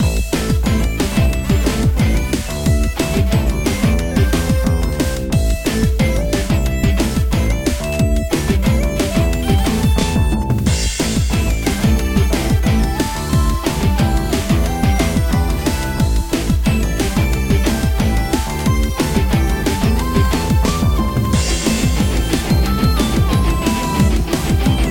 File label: Remix; Beacon